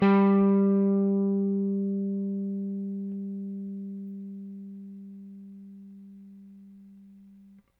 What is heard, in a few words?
Rickenbacker Bass; Airwindows